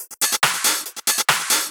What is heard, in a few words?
Loop 140 BPM; Vengeance Samples